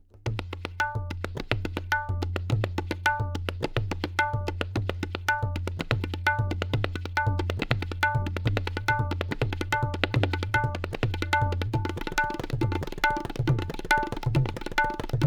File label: Tabla solo